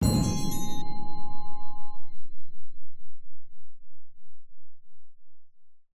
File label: Combo Ab2db Mid; Mixolumia